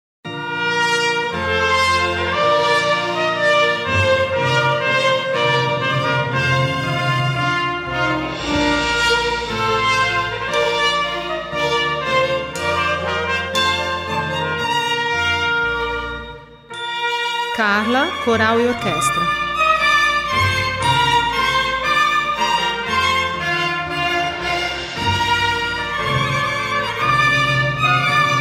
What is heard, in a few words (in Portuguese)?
TROMPETE VOLUNTARY